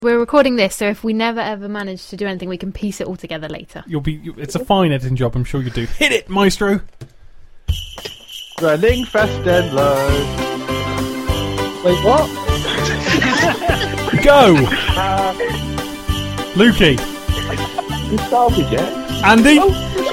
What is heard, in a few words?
bells